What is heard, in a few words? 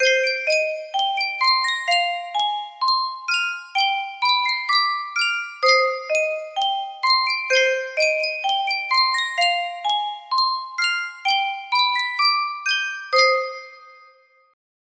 yunsheng custom tune music box